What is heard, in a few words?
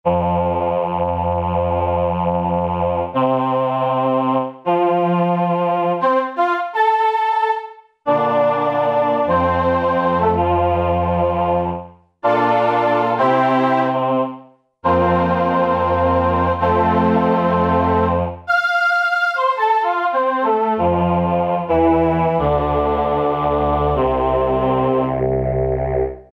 Lo-Fi VP330; Roland SC-880; Factory Patches